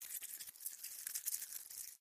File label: Bug Movement; bugs